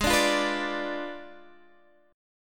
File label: Ab Diminished 7th Ab Guitar Drop D; strummed; 18,17,15,16,15,x; down